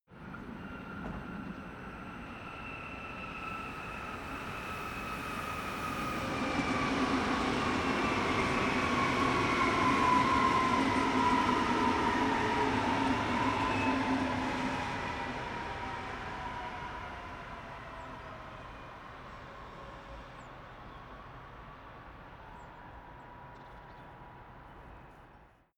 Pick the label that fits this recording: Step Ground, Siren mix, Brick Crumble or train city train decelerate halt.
train city train decelerate halt